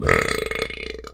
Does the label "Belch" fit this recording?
Yes